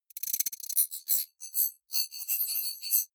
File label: rattling notification